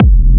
Techno Kick; 30 Techno Kicks 2025; Ghosthack

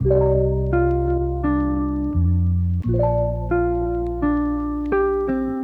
Lofi Melody Loop 85 BPM A# Maj; Melodics; Cymatics - Eternity Sample Pack

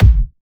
Kick, Bonus Drums

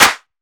Ice Clap, Dubstep; One-Shots; Drums; GhostHack; Ghosthack - AC2024 - Day 13 - Dubstep Sounds 2025

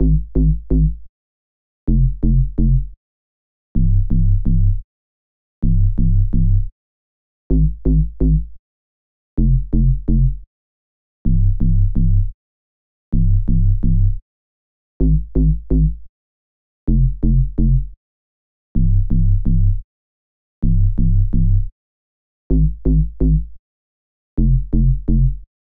128 BPM C Min ARP2600 Bass; Synth; Loops; Loop Stems; ARP+JU60; Cymatics; Fantasy Synth Sample Pack; Fantasy - Yesterday

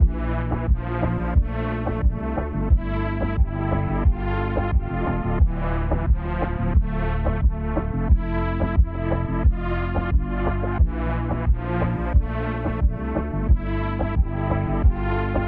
89 BPM D Min, Melodies Latin; Blanco; Cymatics; GHOST (Beta Pack)

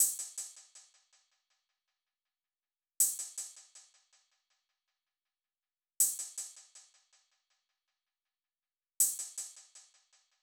Drum Loop 160 BPM Open Hat; Loop Stems; Cymatics; Vibes II Drum Loop Collection; Once Drum Loop

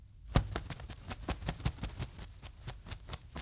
Eagle Wing Flaps; Fairlight Sound Lib

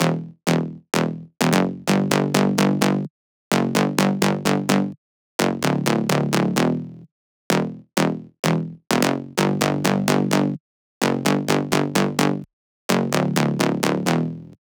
128 BPM E Min Bass; Drop Loops; Volt

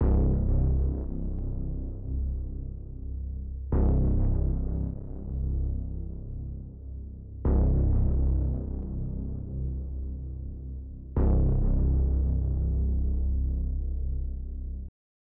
129 BPM C Min Bass, melody loop stem; Trap; Loop Stems; Cymatics; 2022 Melody Collection - Trap Pt 2; Richer Than Ever

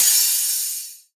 Crash, Cymbals; Raptor; Cymatics; Dubstep Starter Pack; Drums - One Shots

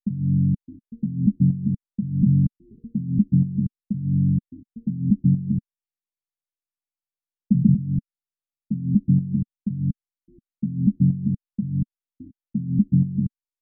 C# Maj 125 BPM Resample Bass; Melody Loops; Loop Stems; Cymatics; Rainbow Road

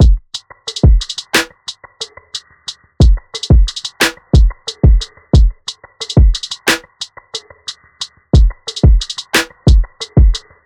Drum Loop 90 BPM; Sail; Cymatics; Vibes II Drum Loop Collection

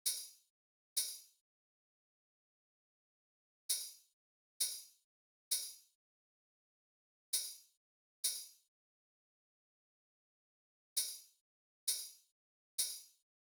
Drum Loop - 132 BPM Open Hat; Loop Stems; Cymatics; DOPE DRUMS VOL. 1; Brunch Drum Loop

